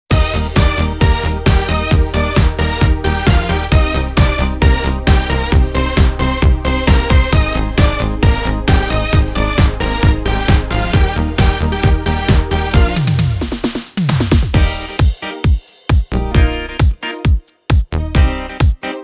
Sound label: Octave Dance